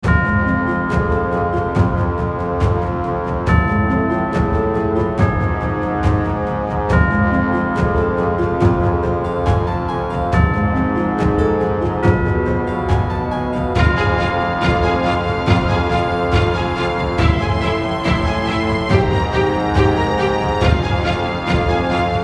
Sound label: sinister power rising epic dark gothic soundtrack